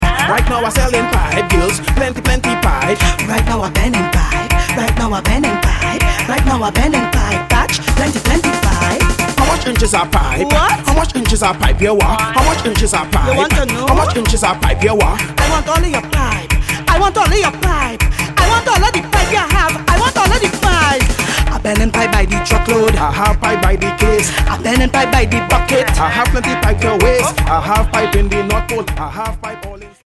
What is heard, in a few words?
Patch Pipe remix